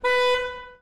Claxon

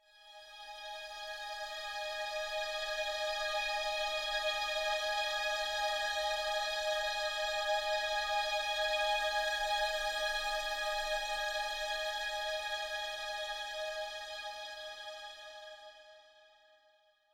Orchestral Scape

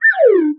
Slide Whistle Down Fast

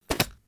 Arrow Impact

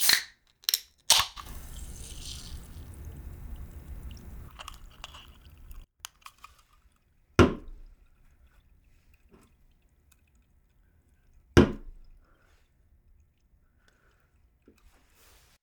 Soda Can Pop Open Fizz

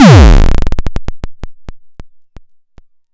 Big Kick

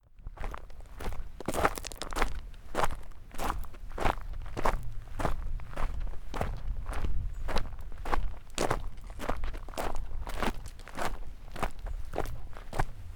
Steps On Footpath With Pebbles

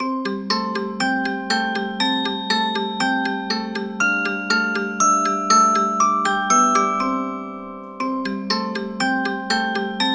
Xmas Bellis

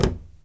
Thump Fumble

